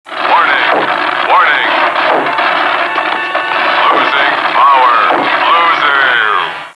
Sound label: losing power; robot b9